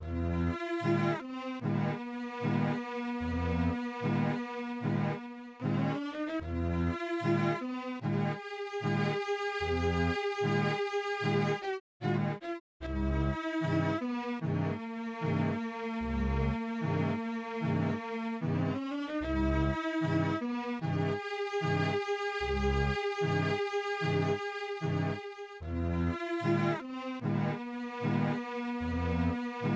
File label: Eerie; Orphen: Scion of Sorcery; PS2